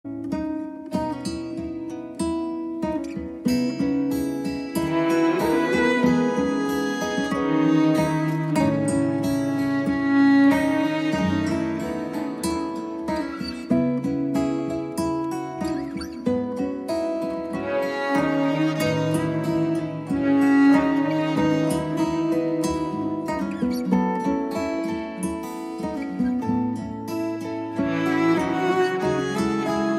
Guitar Sad

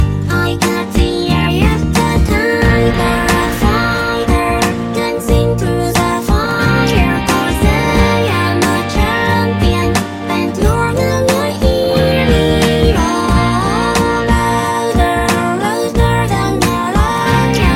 Roar; Miku Hatsune

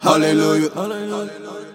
Halllelllujah

